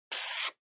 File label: Felt Tip Pen Straight